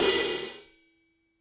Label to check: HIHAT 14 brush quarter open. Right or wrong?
Right